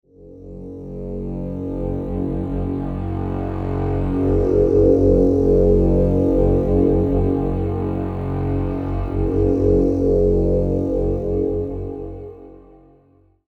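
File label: 174 atmos, Dark and looming; Sci-Fi inspired sound design; FX, Glitch & Reverb Loops & Hits; Tech Flavours; Drum & Bass Sample Pack inspired by the sub-genre of Tech Step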